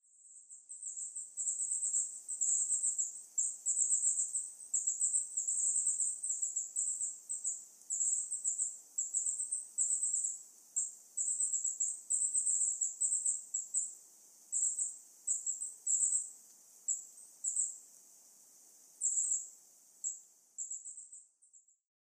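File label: Goldcrest; koe; kikuitadaki; marunuma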